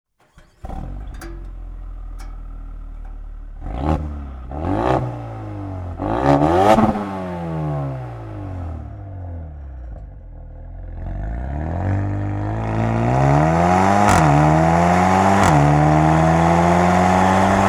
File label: Evolution Mercedes AMG A35 W177